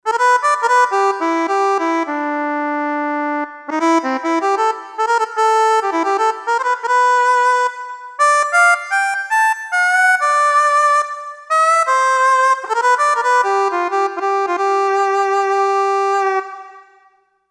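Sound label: Reed Mouth Keys, synth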